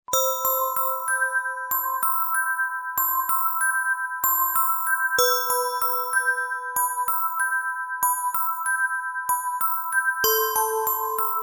MUSIC BOX

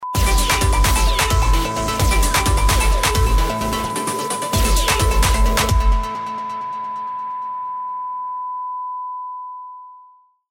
Jingle

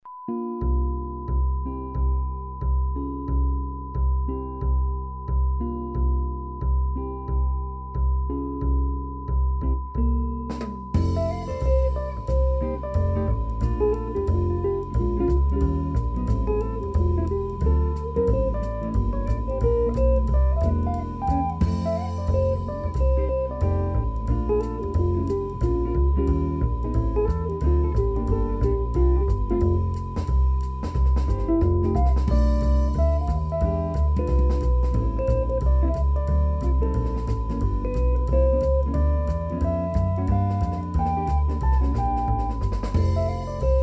music on guitar

